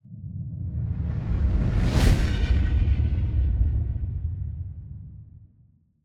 Whoosh, Sound Design, Blast, Tonal, Dissonant, Medium; Airborne Sound; Power Accents